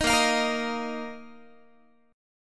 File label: Bb Suspended 4th Bb down Guitar; strummed; tuning 4A,4E,5A,5D,5G#,6C#